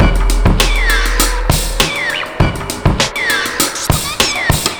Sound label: 100 bpm, breaks; Zero-G Beneath the Planet of the Breaks